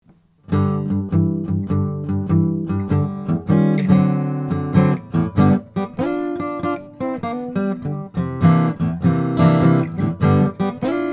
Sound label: c deluxe mahogany, guitars; froggy bottom